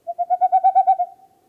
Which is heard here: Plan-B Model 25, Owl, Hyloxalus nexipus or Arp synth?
Owl